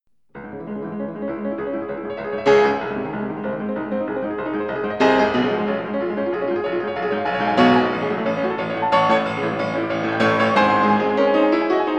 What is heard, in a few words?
piano; Moonlight Sonata 3rd mvt